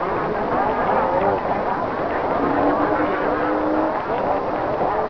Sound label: crowd; hl2; roof top